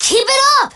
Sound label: Alarm Clock